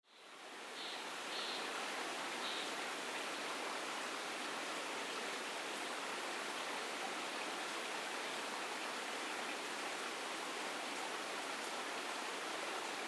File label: monkey in the forest; alishan